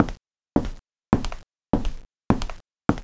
steps; beerdog

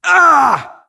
Horseman Wound Crit; svencoop; keenhalloween; horsemen

